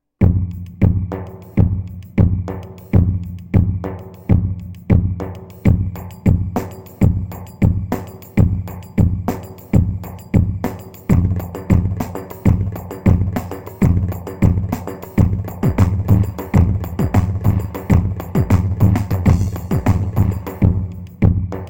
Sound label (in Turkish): pa 500 tr teke 9 16, korg; tr karisik ritimler